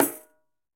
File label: Pandeiro A Thumb; Latin Percussion; Drums; Drum Hits; Tambourin; Ableton; Factory Packs